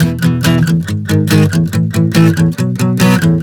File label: E-G#-A-B 140 bpm, acoustic guitar chord progressions